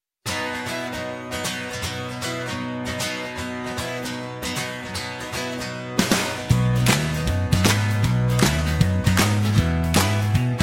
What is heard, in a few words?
(B) No Backing Vocals